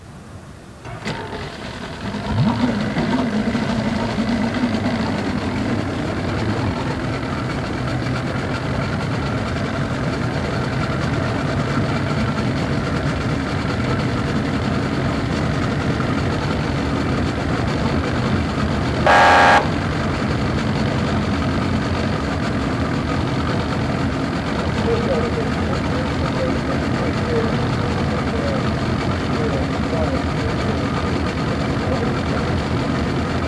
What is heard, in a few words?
radio; WAV Sound Effects